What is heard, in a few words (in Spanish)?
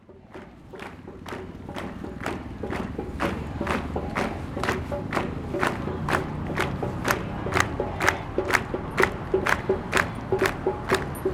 concentración justicia; justicia por julieta del pino; sonidosderosario